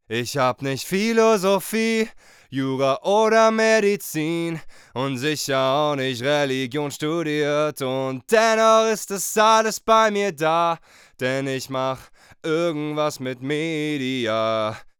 rode nt1a gesang

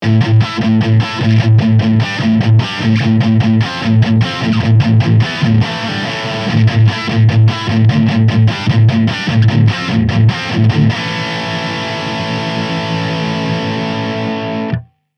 Tube Amp Doctor Silencer test -16 dB zimmerlautstaerke

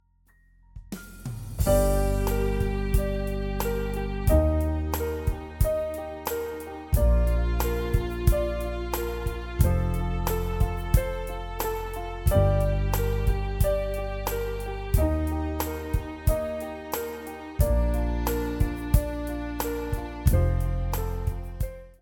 Akustik-Ballade; songwriting

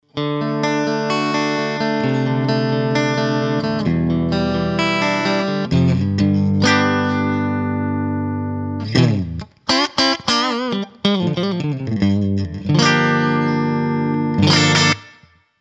fernandes retrorocket pro middle bridge, E-Gitarre

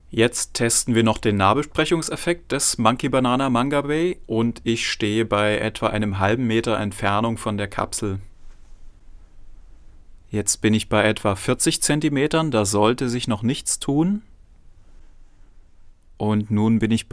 monkey banana mangabey nahbesprechung

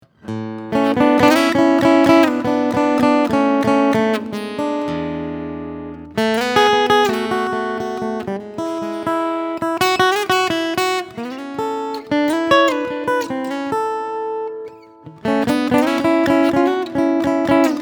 Westerngitarre; randon rgi pw1ce

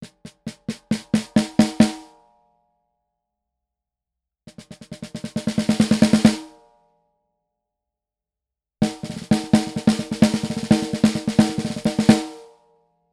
beyerdynamic tg d35d snare stativ mix

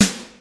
snare drum fett klingen lassen snare

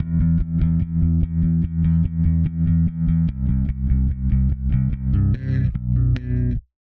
bass sidechain in reaper; mixing

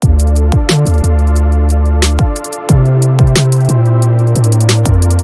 808 mit mehr noten; 808 Producing Guide

